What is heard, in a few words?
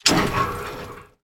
bomb drop; War Thunder